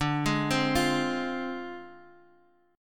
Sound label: D-Minor 7th Flat 5th, D, Guitar, Half Step Down